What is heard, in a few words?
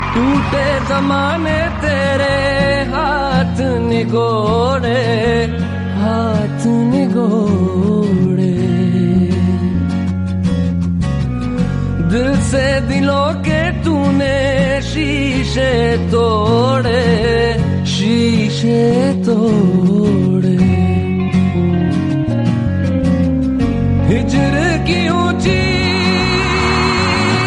rock ringtone